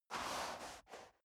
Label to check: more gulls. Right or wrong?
Wrong